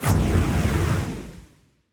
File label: flame